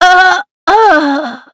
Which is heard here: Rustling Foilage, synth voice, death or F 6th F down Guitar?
death